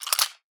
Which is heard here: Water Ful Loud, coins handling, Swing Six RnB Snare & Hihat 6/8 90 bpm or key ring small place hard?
coins handling